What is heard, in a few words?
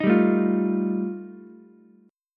G 7th Suspended 2nd Sharp 5th G chord, Ukulele; strummed; JGuitar